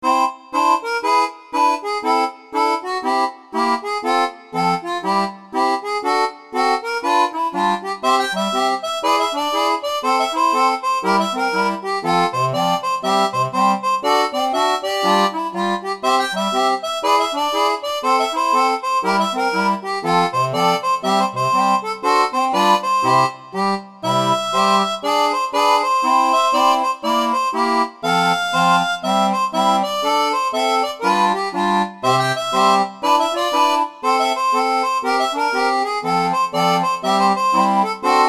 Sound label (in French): seraphone, orgue barbarie